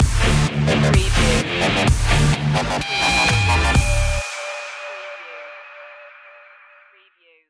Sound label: club metal stinger